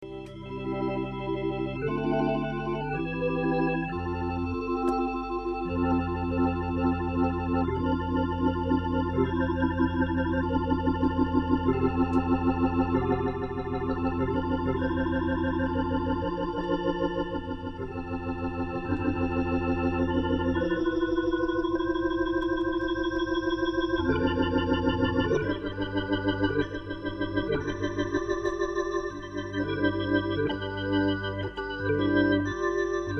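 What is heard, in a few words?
Leslie Spin, Hammond T-522 Tone-wheel Organ; The sound of the internal Leslie at fast and slow speed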